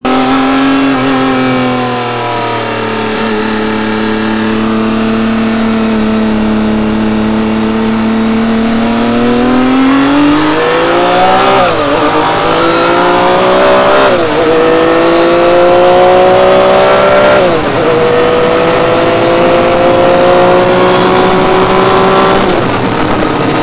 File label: ferrari f50 bi turbo